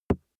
plastic cream bottle hit

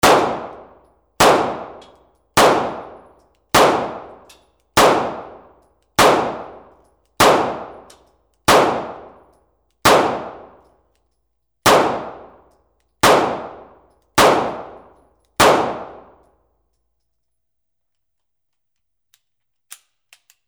9mm handgun multiple shots

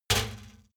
trash basket metal hit soft

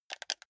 console controller click combination

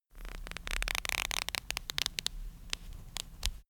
stretch creak

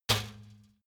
trash basket metal hit

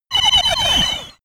sci-fi laser shot burst

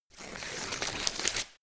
tear thin paper

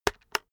keyboard external enter hit slow

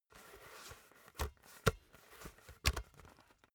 basketball handling

